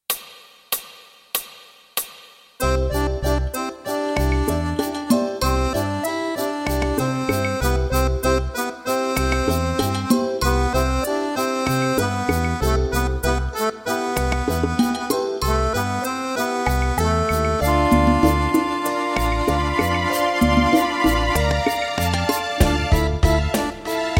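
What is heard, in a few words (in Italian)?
Fisarmonica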